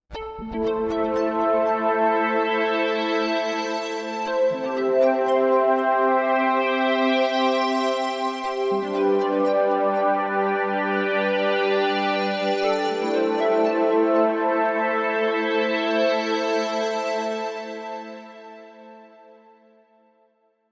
Vulcan Lute

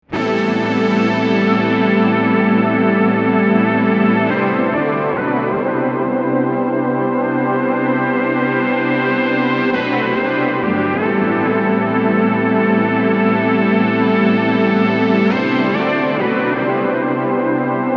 axe minimoog

